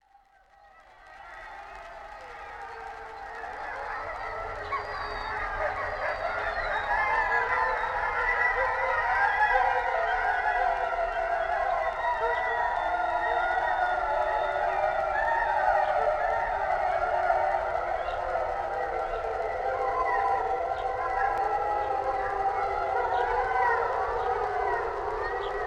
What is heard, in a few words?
Wolves